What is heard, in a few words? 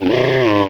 Yeti Hit; The Battle for Wesnoth